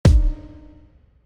step; brainrot; eco cavalo

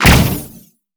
shield hit; skbt astro fleet

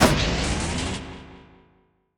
buffed on; tf2

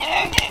Spawn Deer; quitoypongo; cursed deer

